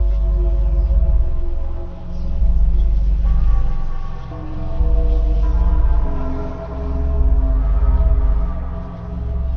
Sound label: End suspense Loop; Doom 3; Alphalabs1